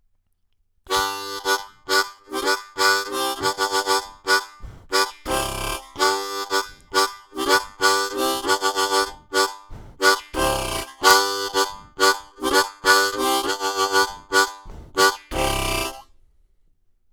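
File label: Rode NT1 A rhythm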